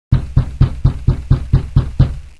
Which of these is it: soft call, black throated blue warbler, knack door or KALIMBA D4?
knack door